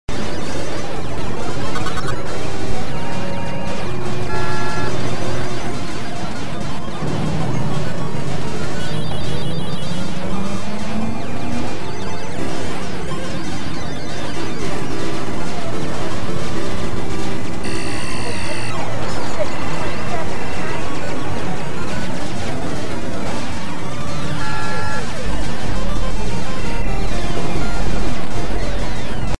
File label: arcade sample